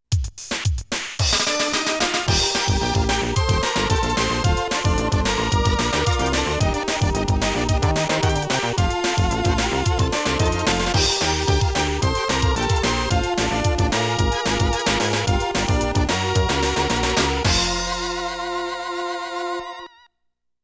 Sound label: Ending - Happy; Aero Fighters 2; Sonic Wings 2; arcade